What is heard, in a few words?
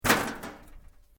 METAL LIGHT CRASH; Materials